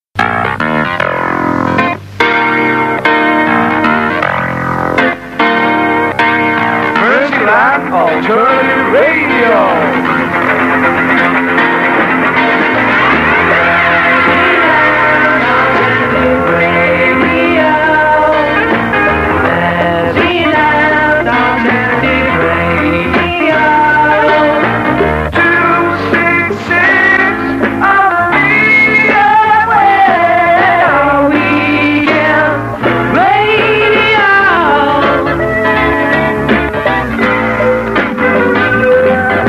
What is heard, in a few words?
jingles, song; Merseyland Alernative Radio Song